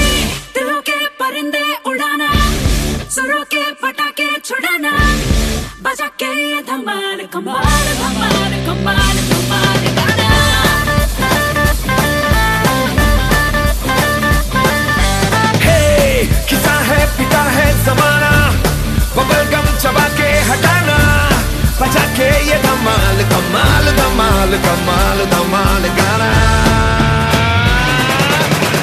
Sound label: Remix Hindi Ringtone